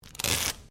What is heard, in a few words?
Sticky Tape Rip; common tape; Offices